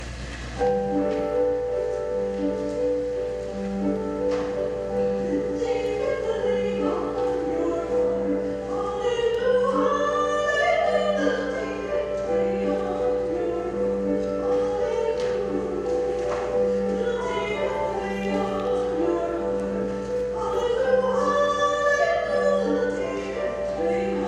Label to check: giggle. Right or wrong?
Wrong